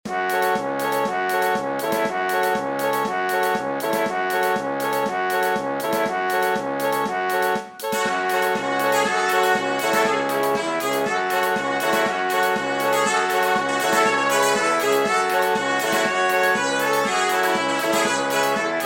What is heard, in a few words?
Levan Polka; Conducteur